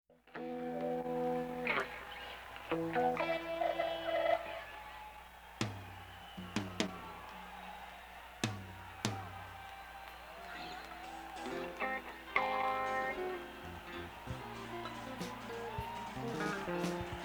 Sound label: Tuning